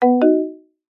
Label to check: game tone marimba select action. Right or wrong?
Right